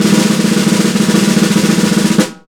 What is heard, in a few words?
Snare, Roland LA Composer